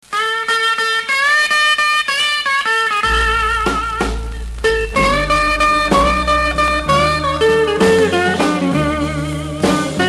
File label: blueguitar